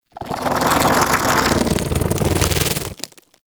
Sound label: InfKDriveBug Vocal Idle; Warframe; Hunting Critters